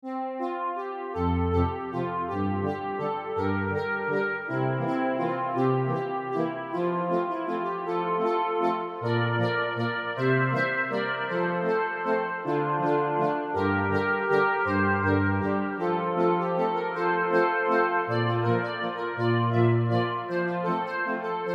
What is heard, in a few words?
Accordion